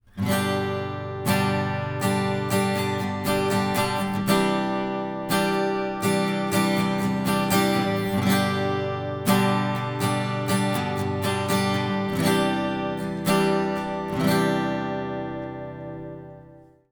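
two at2020 stereo mix delay 10 ms, acoustic guitar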